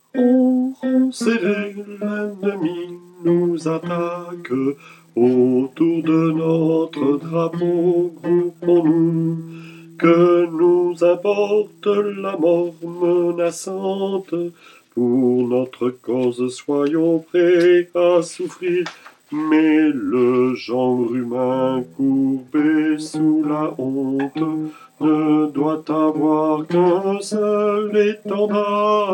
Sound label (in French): varsovienne basse, chorale